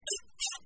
remove item from tray; Delicious Emilys Wonder Wedding

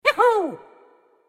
worker joy; New Yankee in King Arthurs Court

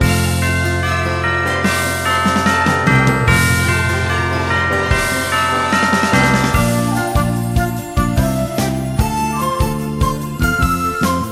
Menu; Delicious Emily's Wonder Wedding

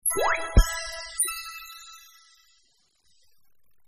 target score reached; Delicious Emilys True Love

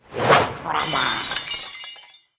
witch magic; Rescue Team3

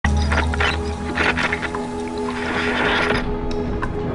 lock TV video; Stray Souls Dollhouse Story

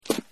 food on tray; Delicious Emilys True Love

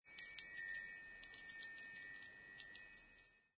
show hint; Agatha Christie Death on the Nile

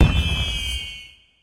salute; Rescue Team3

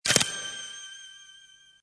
object click good; Agatha Christie Death on the Nile

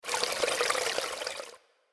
well; New Yankee in King Arthurs Court

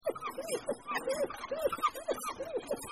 cleaning loop; Delicious Emilys True Love

